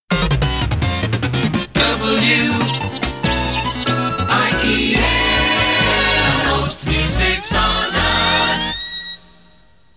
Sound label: jingle